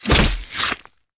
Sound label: nasty stab, blades; weapons